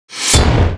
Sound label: ATTACK